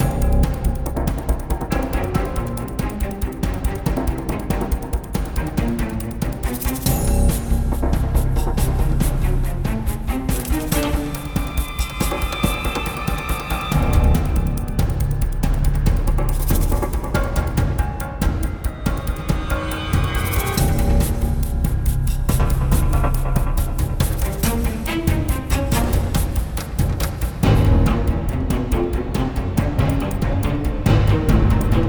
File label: Action Boat